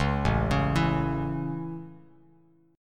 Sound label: A Major, Bass Standard; arpeggiated